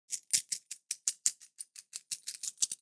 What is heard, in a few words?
coins shake